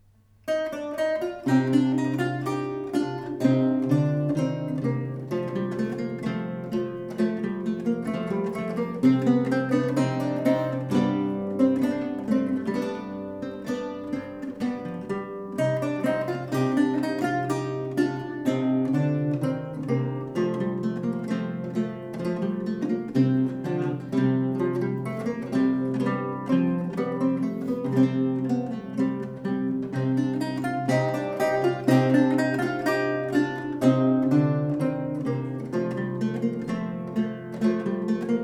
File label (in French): pavane 2 luths; Attaingnant